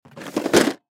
Drawer Plastic Desk Top Drawer Unit Open Supplies Rattle; Office; Blastwave